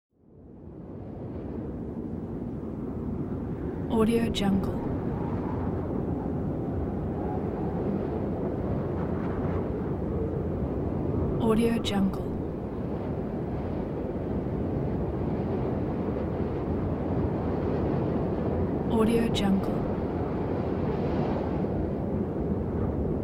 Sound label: wind